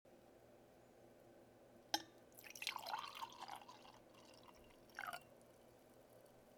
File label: filling wine glass